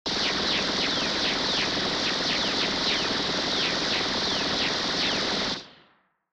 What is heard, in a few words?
Retro Anime Gun Long